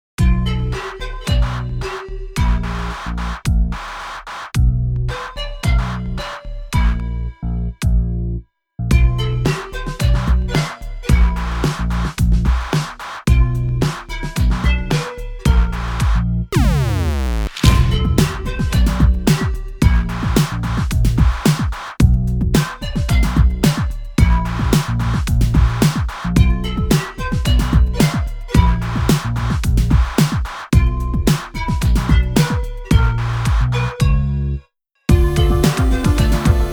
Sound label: lofi hip hop beats